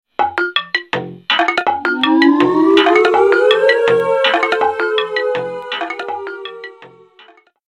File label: savanne alarm